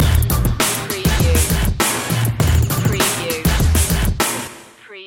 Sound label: choppa hoppa sting